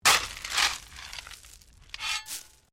Digging Shovel Stones; Various digging tools and processes; Construction; SoundEffects Foundation2

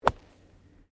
GOLF SWING WEDGE BUNKER SAND

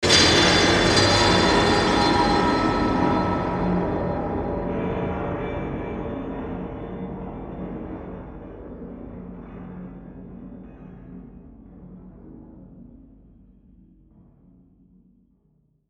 Hit Crash Bell, Hit Crash Bells Metallic Eerie

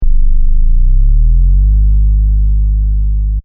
Techno-Trance Sub Bass Loop In G 142 BPM

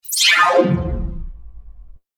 Robot Sine Bubble Down Scifi Future Interface; Robot Sound Effects

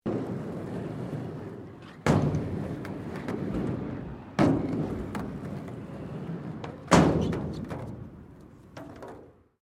SKATEBOARD HALFPIPE METAL MANEAUVERING; Skateboard sounds; Skateboarding (Sound Effects:Sports)

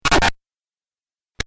Retro Glitch; Glitch Sound Effects; sound design

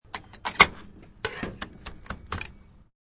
Gas Pump Back, Puttling gas pump back onto holder; Gas Pump (Sound Effects:Machines)

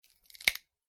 horror bone finger snap, Finger/Small Bone Break; Breaking; McKinney Sound

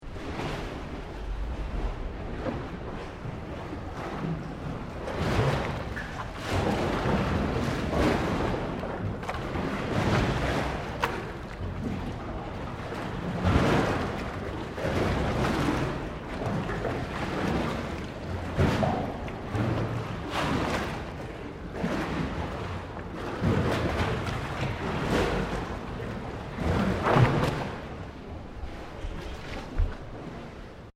Water Heavy Waves Under Concrete Wharf Loop; Elemental weather effects; Ocean (Sound Effects:Nature)

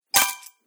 Magic Treaure Coin Pick Up, video game

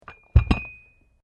Weights Dumbell Put Down; Gym weight lifting equipment; Sports

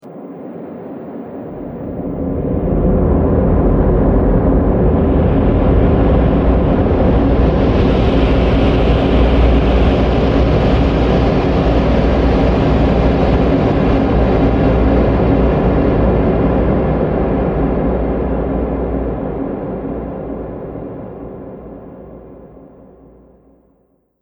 Fantasy Gathering The Magic; Andrew Fleming

